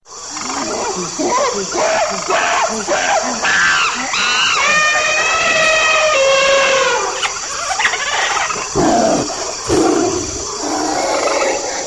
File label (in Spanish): selva